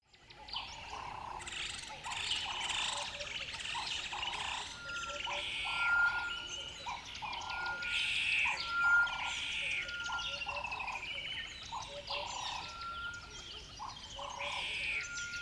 river bed dawn birds sing

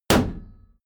sword hit shield

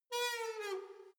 letterbox metal push close soft

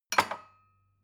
glass shelve wood

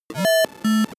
broken computer high short

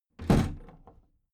big plastic case lid put down regular

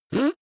beatbox effect suck in

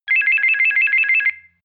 telephone

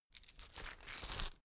key ring large pocket put

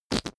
whoopee cushion fart double

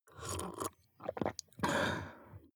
drink glass milk slurp small

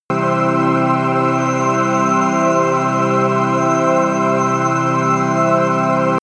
promo pad

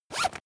zipper use fast

